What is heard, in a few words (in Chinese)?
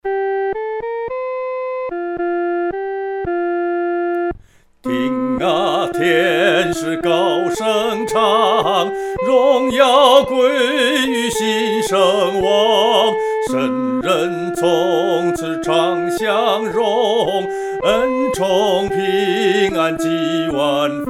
独唱 第一声; 听啊，天使高声唱